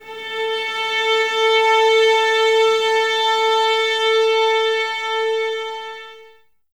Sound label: violins A 4, string ensembles; Miroslav Vitous; 11 Violins